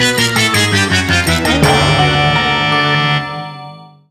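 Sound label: jingle mission fail; mario super sluggers; wii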